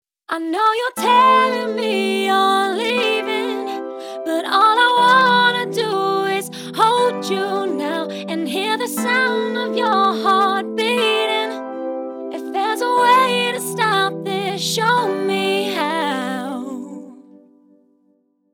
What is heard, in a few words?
autotalent slow tuning